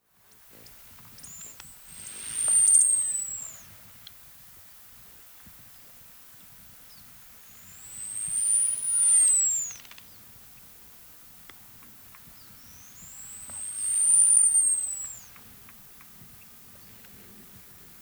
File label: Calypte costae; Chordata; LandBirds